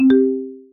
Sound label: member join